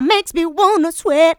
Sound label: Female Vocals; Houseworx